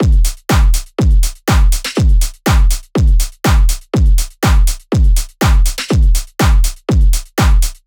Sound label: drums 122, Full Drum Loops; Slate; SEISMIC Drum Sample Pack